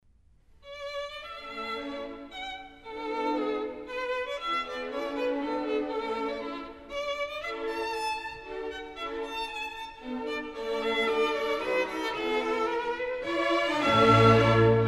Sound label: Violin Concerto No. 5 in A major; Mozart Violin Concertos 3 & 5